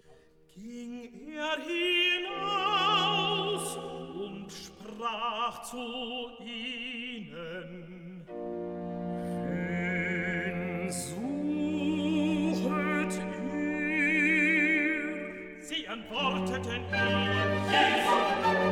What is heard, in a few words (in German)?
TENOR, Stimmen mit Klavier; Johannes Passion